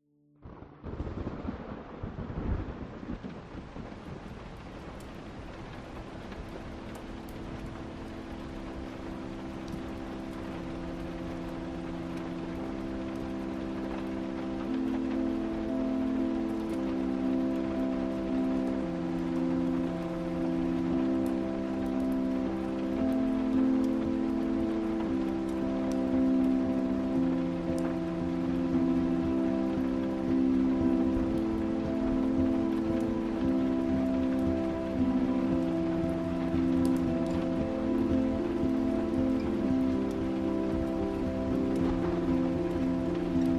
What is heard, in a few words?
Ambient Music Night Rain; Life Is Strange; Relaxing, Sleeping, Studying